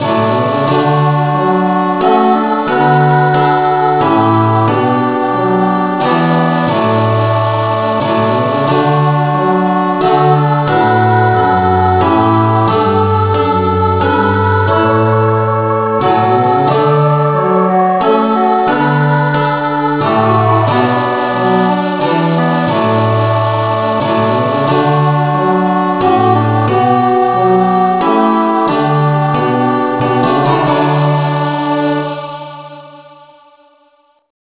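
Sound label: no vocal key d, Amazing Grace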